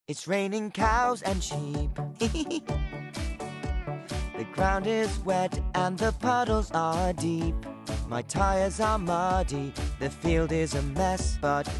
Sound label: Kids Song